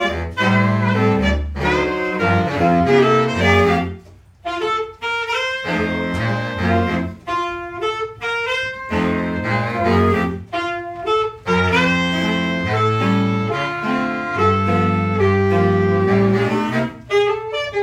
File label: Postlude